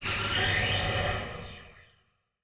Transition Whoosh Fly By; Production Element; Title Transition; AdobeCC

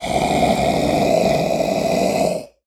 Animal Mammal Carnivore Cat Lion Hiss; animals; AdobeCC

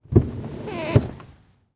Truck Ford Ranger Adjust Seat Tilt; transportation

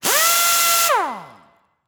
Industry Tool Air Gun Run Medium Close

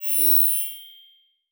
Title Transition Crystal Glow; Production Element Title Transition

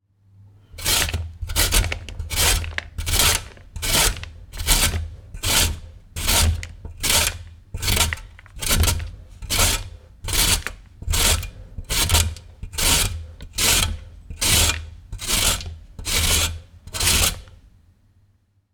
Rubbing Carrots, Kitchen Sounds; Sound Ex Machina; GDC Game Audio Bundle

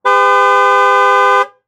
Truck GM Envoy Honk Horn Long; transportation

